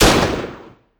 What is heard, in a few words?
Shooting; 完善武器音效; DungeonShooting; Godot